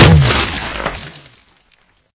footstep, strider; hl2

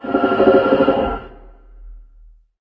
search, antlion guard far; hl2

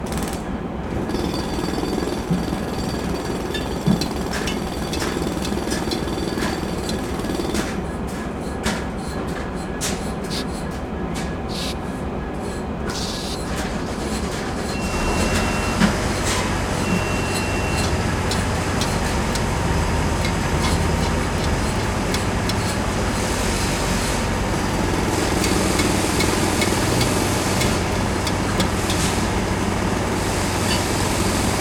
Construction Scene, Construction Sites; Larry Salzman

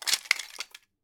Dropping Wood Panel Multi; InspectorJ

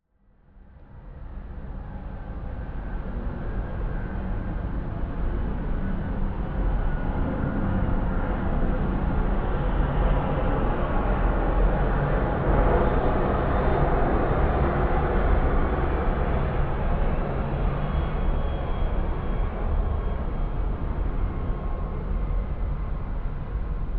Plane Passbys window interior; Planes; Fox Audio Post-Production; Planes Passbys – Interior-Exterior

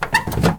microwave door open; 3maze; Doors

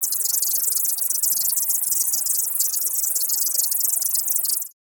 Technology Scanner; DavidDumais; Robotic Creatures Sound FX

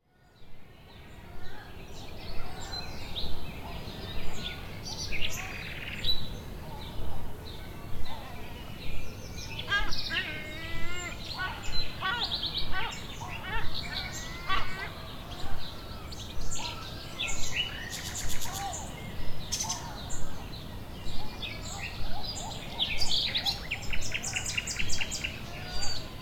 Nature ambience with seaguls; Nature Sounds

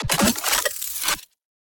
Mech Processed Metal, Sci-Fi Energy Weapons; Rescopic Sound

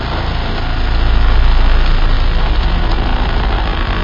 search loop, combine gunship; hl2

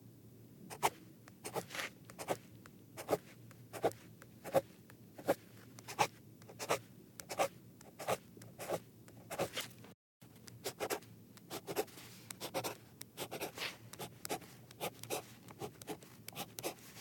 Handwriting; TheWorkRoom Audio Post

